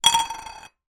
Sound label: foley tin empty metal small down on table; zapsplat